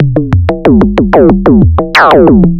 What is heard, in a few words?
FM Perc Loop, Analog Glitch; Polyend; Stazma & Concrete Collage